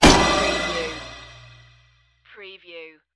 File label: space metal hit